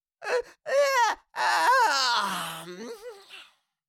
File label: Cartoon little monster voice yawn